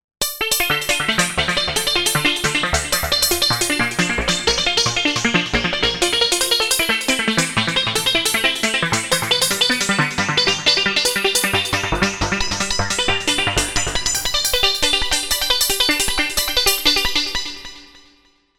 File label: Metalix arp